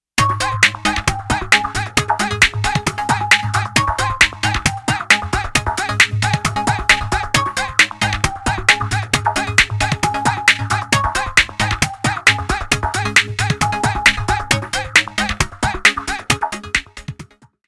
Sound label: techno, ringtones; Cisco